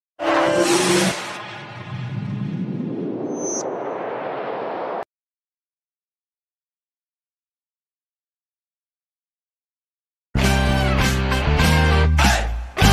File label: bgm ringtone telugu bgm; raghuvaran btech; dhanush